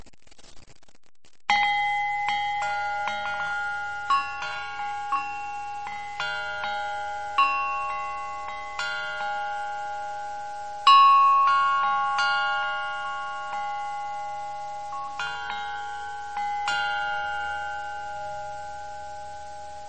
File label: Boothbay Harbor Bell